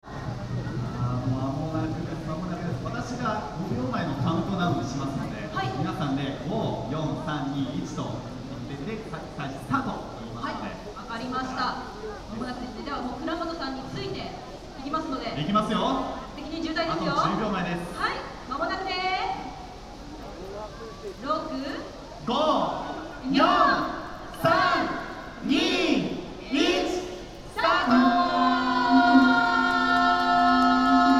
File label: opening, dance; Fukushima Soundscape: Machi-naka Park; Machi-naka Square; In front of Machi-naka Square; In not good weather, rain was on and off, Waraji